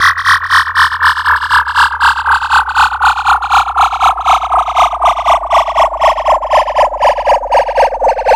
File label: FX SWEEP, SYNTHSWEEPS